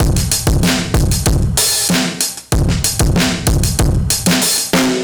Slack Drums B, 95bpm, Drums n Perc, analogue circuit; musicradar